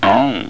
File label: OUH